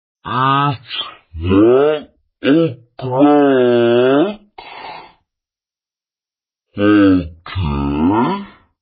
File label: clock